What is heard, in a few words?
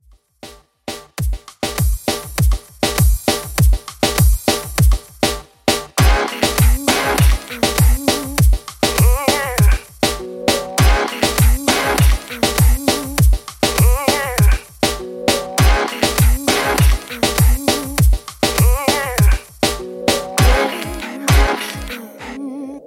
Moombah 100 Bpm; Redrum